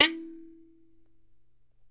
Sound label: KALIMBA; E-MU Producer Series Vol. 7 – Old World Instruments